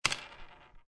Drop Pen On Desk, Ballpoint pen dropped on desk with slight roll; Pens; Offices